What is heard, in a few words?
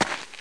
player dirt; Group Mapping Project